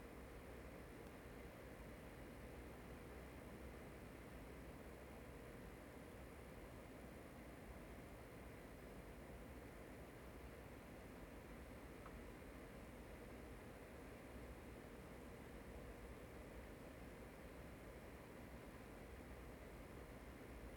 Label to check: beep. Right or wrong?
Wrong